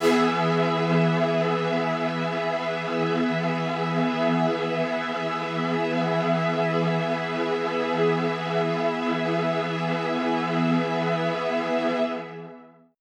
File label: Poly String E maj, synth orchestra